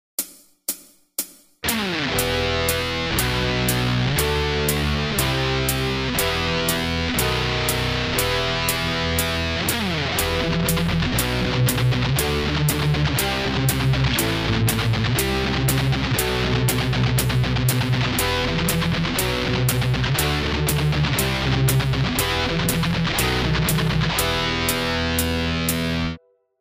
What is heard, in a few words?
Backing Track